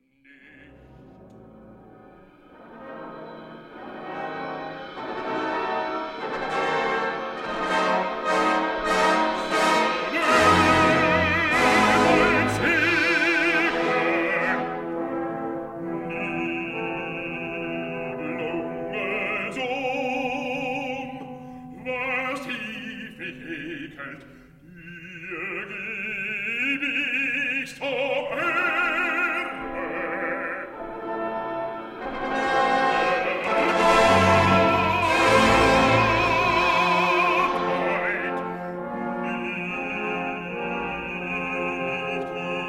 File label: Horn; Orchestra Audition Excerpts; Walkure Act 2 Horn 4; Solti